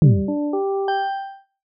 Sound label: logo signature pulse